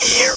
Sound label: pain; slith